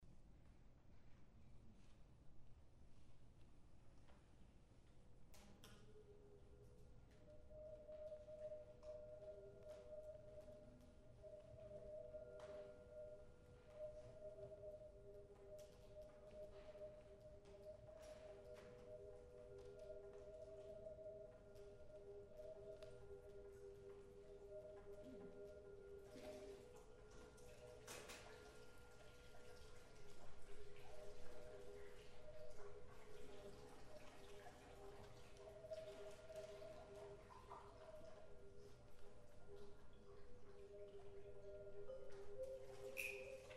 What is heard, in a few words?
ensemble